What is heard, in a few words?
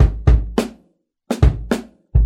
Boombap drum loop